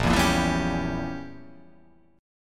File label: A sharp Diminished 7th, chord